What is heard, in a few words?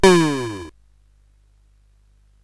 sid CSG8580R5; Smash TV